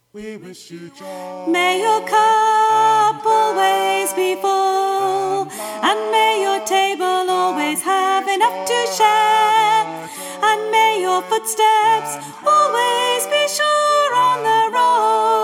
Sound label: May your cup always be full Soprano